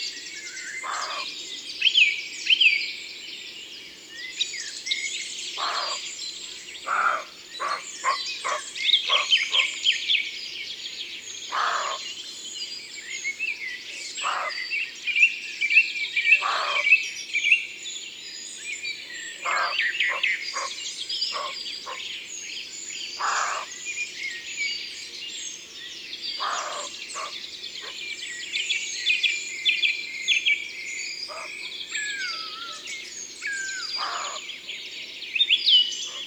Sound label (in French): Chevreuil; Concerts Naturels de la Drome; Studio les Trois Becs